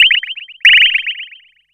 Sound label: Sine Step